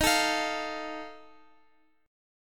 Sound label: Eb Suspended 2nd Flat 5th Eb Guitar 4A 4E 5A 5D 5G sharp 6C sharp; Ebsus2b5 strummed; JGuitar